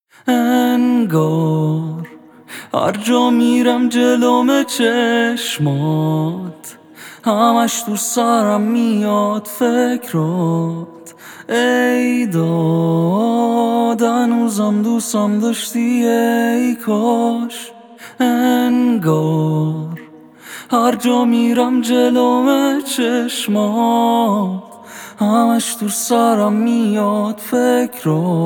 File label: vocal fx